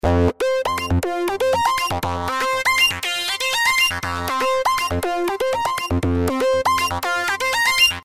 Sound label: loop